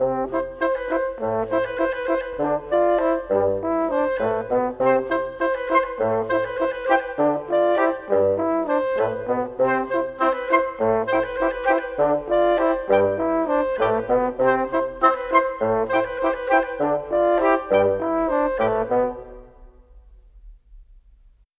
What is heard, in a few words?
Cinematic, Orchestral Series; Sonic Foundry (Sony Creative Software); Orchestral Series VOL-3; Cinematic 26